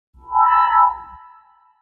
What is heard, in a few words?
stone deactivate; medallion; Fantasma